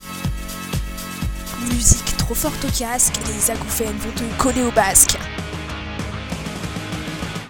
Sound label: jingle